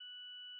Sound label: Gauss Filt F#6